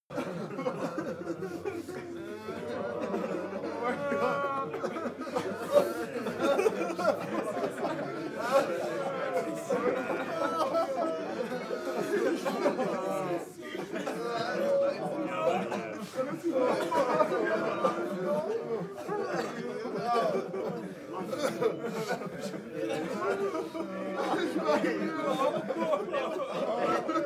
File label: walla sad long; menschen; koerpergeraeusche